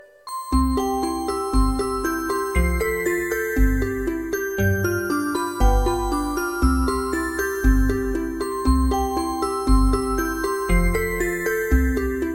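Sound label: ringing music ringtone; zvonkaya muzyka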